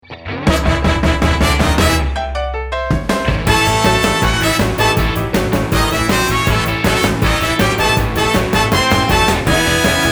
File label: Ocean Sounds Instrumental